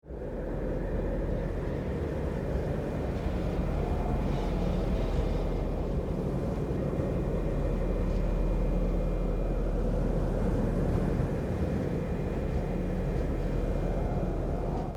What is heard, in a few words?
Spaceship ambience loopable exterior